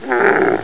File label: IMP DIE